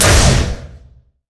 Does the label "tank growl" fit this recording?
No